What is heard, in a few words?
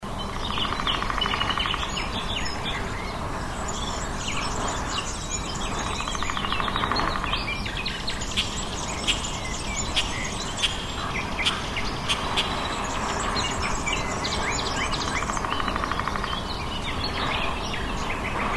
Soundscapes Forest